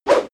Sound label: sword whoosh